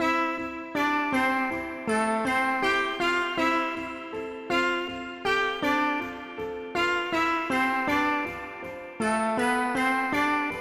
160bpm 96beats

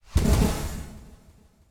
zeppelin; lab zeppelin 01

